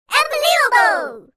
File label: contnuous Match; kaixinxiaoxiaole